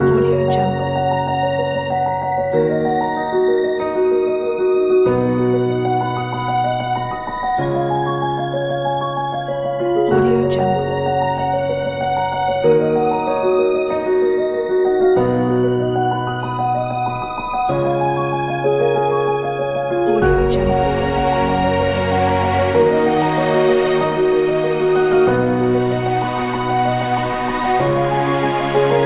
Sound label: uplifting inspirational sentimental